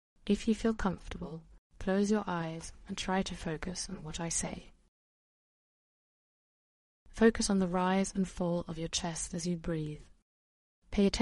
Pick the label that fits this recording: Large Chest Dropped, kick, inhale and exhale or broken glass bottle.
inhale and exhale